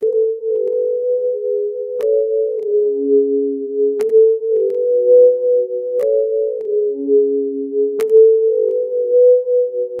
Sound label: when you remember a place, dream